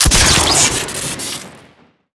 crossbow shoot crit; weapons; crusaders; tf2

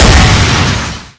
giant explodes; giant common; Garry's Mod; tf2; mvm

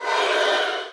gloves scream; weapons; breadmonster

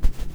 step; poppf female pianosaur; critters

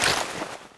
draw bow; tf2

